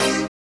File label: dance chord